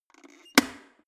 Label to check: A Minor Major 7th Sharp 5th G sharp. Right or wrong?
Wrong